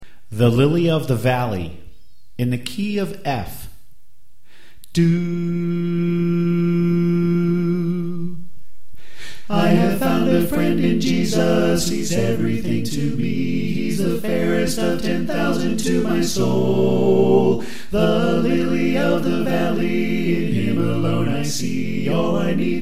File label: Soprano Left